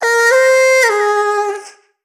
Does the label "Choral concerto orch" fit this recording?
No